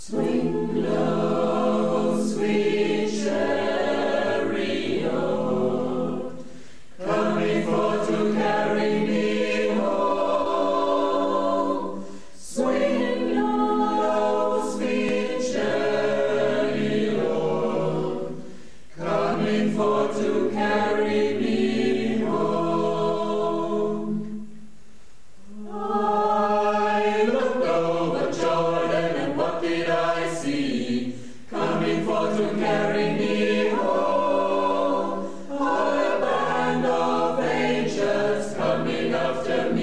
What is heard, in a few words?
Swing Low